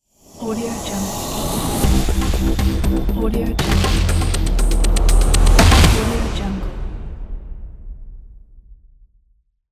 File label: epic action trailer logo; macro-media